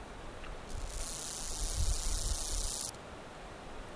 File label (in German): Chorthippus pullus ein Vers; WSchweighofer